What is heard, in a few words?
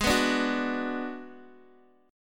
G sharp Minor 7th Flat 5th, Guitar Open D; x,11,9,8,9,x